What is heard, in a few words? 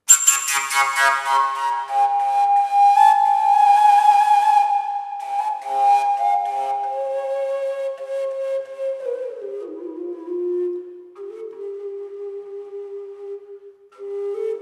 sample, overtone fujara